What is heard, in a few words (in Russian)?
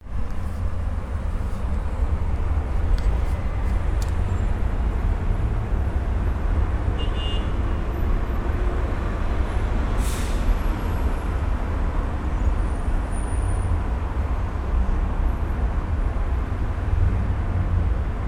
Zvuki shum mashin mashini